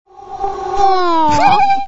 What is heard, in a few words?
SMS ha